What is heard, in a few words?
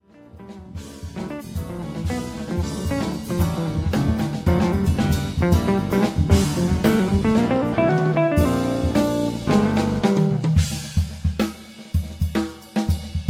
drum solo